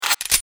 vector switch; weapons

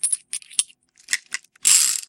m1895 reload; weapons

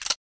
usas12 switch, weapons

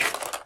medikit pickup

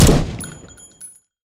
aug fire; weapons

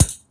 fence hit; hits

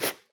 gauze pickup

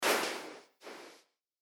sand destroyed; hits

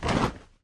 vest pickup

